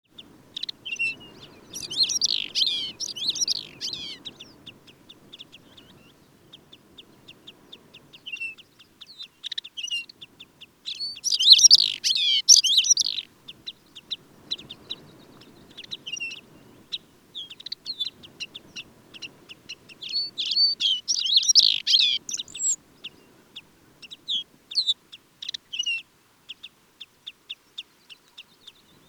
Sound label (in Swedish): Lappsparv